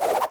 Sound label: MOVE; C64 Dreams; Multi-Sound Default